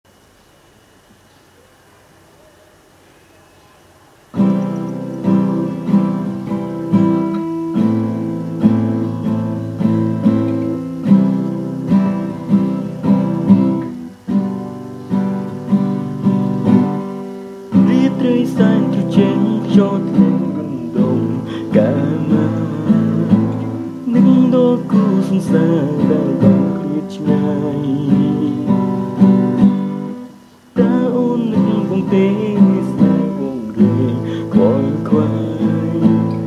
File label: sing